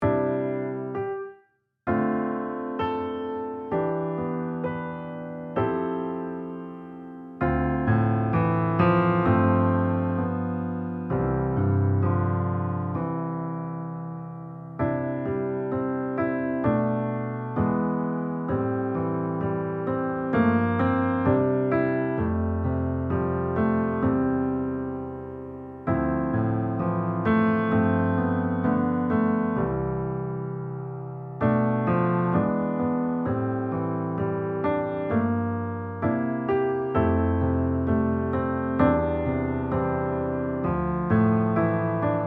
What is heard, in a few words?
65bpm c ballad; Suno